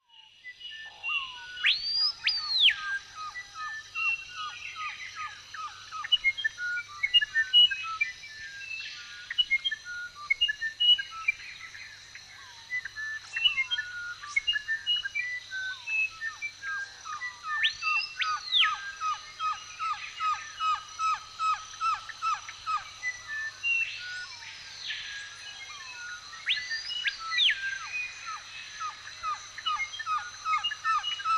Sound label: Birds chirping in forest